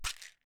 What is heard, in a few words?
Pills Grab